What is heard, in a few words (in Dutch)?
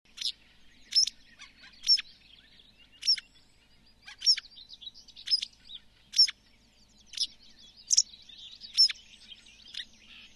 witte kwikstaart